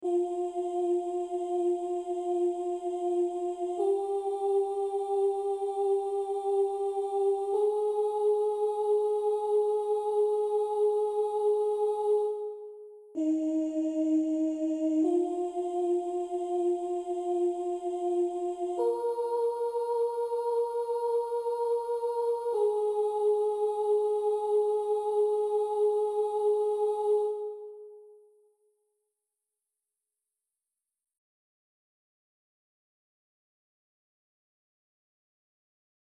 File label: pie jesu sopraan midi